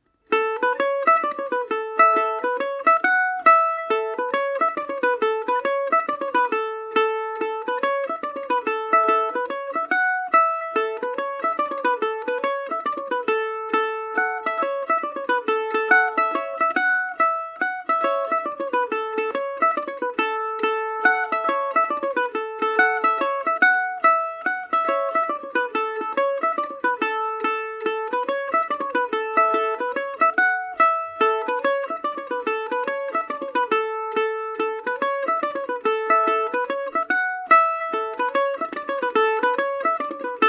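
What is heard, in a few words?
A major paris swing, irish mandolin; maggie shanleys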